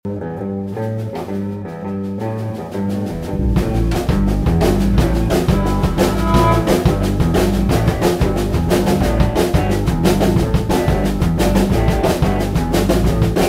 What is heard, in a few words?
Hop Drum Hop